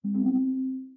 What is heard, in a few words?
gentle Chime